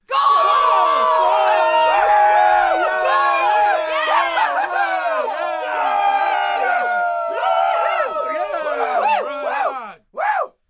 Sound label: Group Cheer Goal, Cheering, yelling, group; Crowd